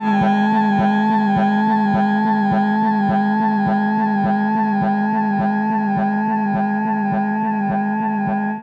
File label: pitched, Feedback; 99Sounds; Dark Engine